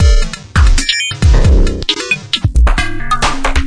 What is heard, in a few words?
131, drum loops and breaks; 131 to 140 bpm; samples-ghost